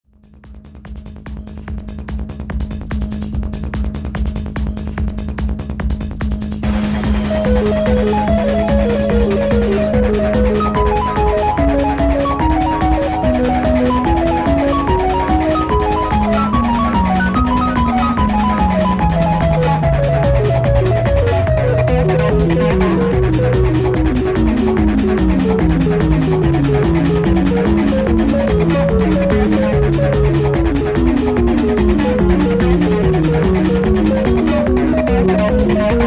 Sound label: Acid Thunderstorm, acid trance